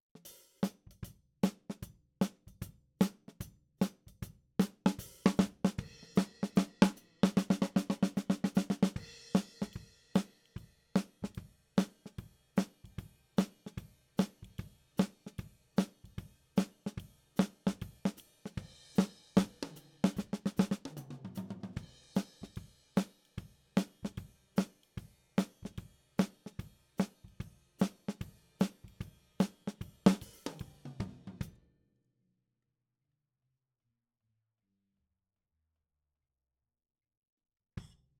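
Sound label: Snare top, Ragtime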